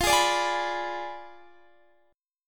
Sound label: B Diminished 7th F, guitar